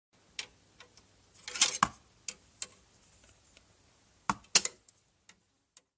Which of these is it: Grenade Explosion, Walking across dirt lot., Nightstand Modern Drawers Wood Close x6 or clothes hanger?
clothes hanger